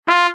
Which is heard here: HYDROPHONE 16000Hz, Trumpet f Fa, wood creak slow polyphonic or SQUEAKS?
Trumpet f Fa